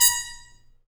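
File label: brass trumpet mute stab, Harmon stabs; Harmon Amb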